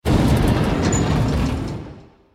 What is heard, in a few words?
explosion; New Yankee in King Arthurs Court